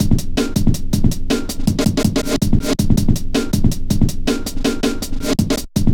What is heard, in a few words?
metallic stepz, drum loops